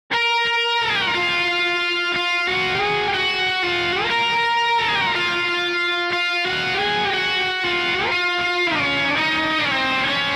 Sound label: b gtr